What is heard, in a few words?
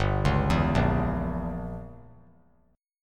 G Diminished 7th; arpeggiated; JGuitar